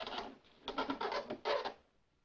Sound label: squeky chair